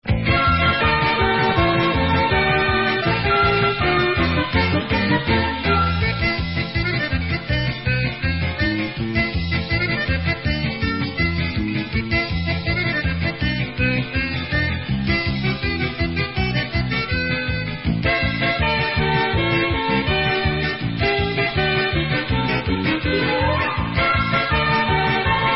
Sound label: Hora Instrumental